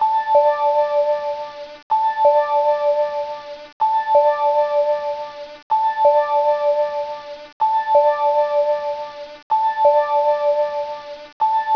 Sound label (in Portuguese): sint Loop